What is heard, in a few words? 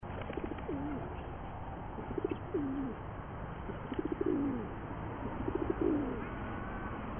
kawarabato nakigoe